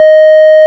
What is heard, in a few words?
ORG, Farfisa Clarinet